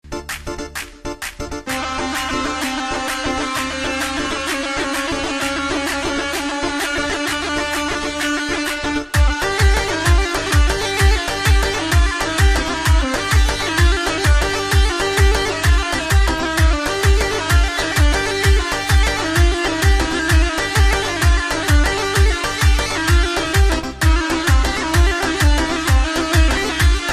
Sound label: koronos; melody